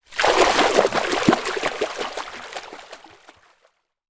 animals dolphin swim away surface water fin splashes